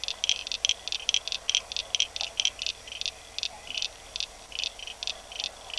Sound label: Crinia signifera